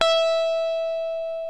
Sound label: LES PAUL